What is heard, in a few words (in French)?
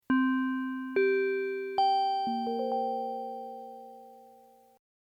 FM DX7 SNCF 1990; Carillon SNCF des années 90. Une démonstration de l'utilisation des niveaux d'enveloppe pour produire plusieurs retards